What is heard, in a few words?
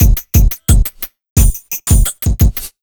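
175 UK beat, Ragga & Dub Reggae; USB Soundscan vol.27; AKAI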